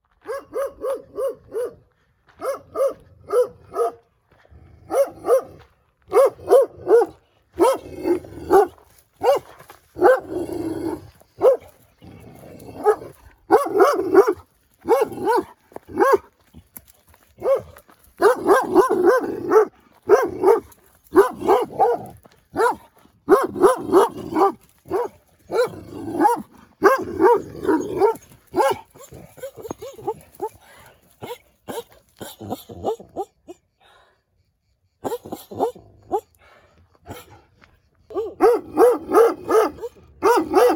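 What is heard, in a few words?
dogs barking at a passerby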